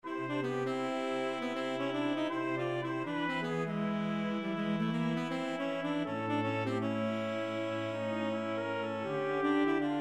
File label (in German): zweite Stimme Akkorde